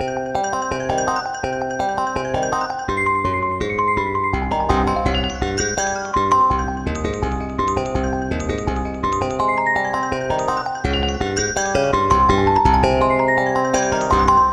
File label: CARTOONY